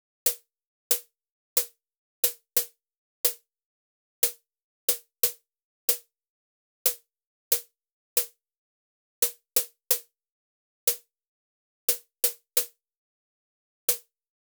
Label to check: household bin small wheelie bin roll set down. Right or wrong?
Wrong